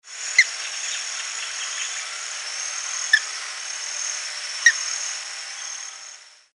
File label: Falco rufigularis calls